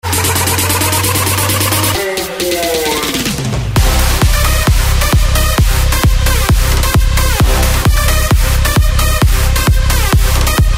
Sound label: big room dance house hard house